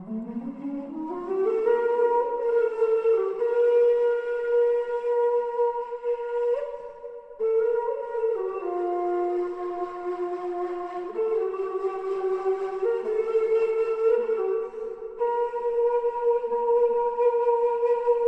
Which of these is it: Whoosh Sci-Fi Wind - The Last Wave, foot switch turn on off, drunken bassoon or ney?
ney